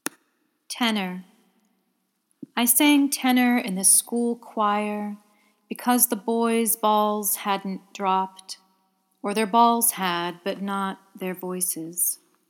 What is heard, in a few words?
Tenor